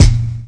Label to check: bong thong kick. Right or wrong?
Right